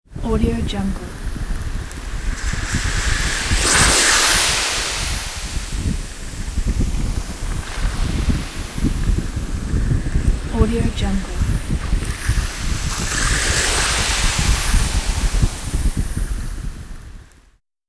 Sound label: windy ocean